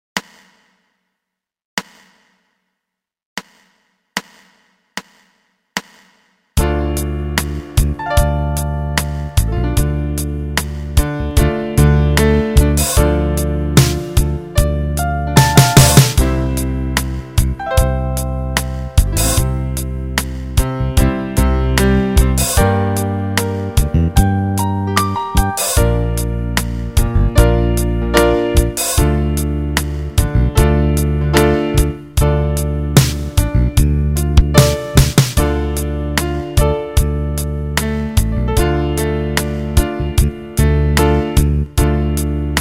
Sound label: Air, Rock